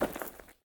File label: dirt step; Golden Crayon Sounds